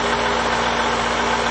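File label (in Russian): генератор шумный в пространстве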